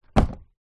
cardboard box down on carpet; foley

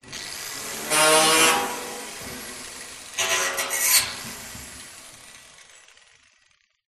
industrial angle grinder cut mistake; zapsplat

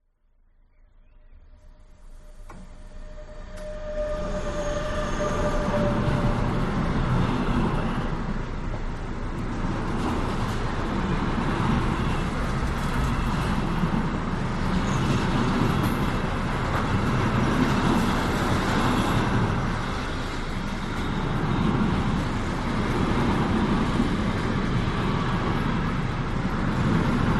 train pass by long Germany

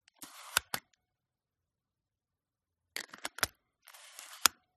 fuji camera lens hood on off screw on handling; mkh8040; PMSFX

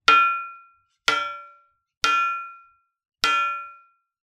impact metal rsj steel light impacts x4

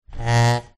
household chair slide wood floor rub squeak

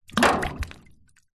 foley stone small drop into metal tank of water; zapsplat

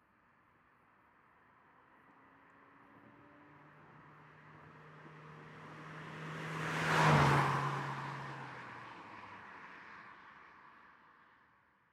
Car By Mondeo 40mph; noise creations

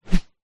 warfare axe or other heavy weapon swing

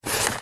foley plastic dial turn short

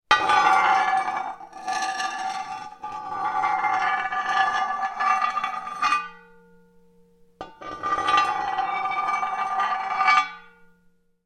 foley metal scrape drag hard floor

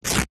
foley t shirt thick rip

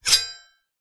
warfare sword medieval heavy draw scabbard

